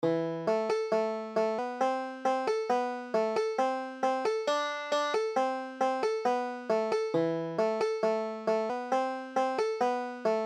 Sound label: ale brider, banjo